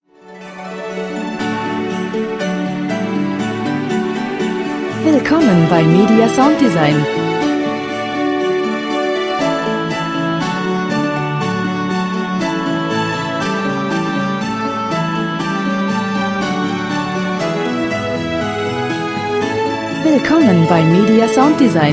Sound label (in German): weihnachten; kling gloeckchen